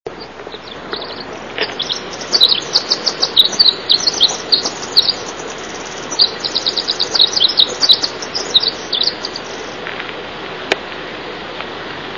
wren winter; Hoh Rain Forest, Olympic National Forest, WA

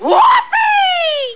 whoopie

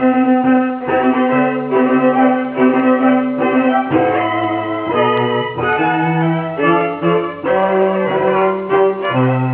march, quartet

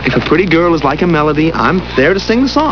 singsong; Iolaus

